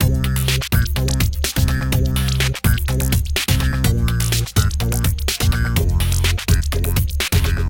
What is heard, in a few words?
free spin melody; Amatic; Hot Neon